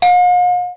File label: BELL; BELLHORN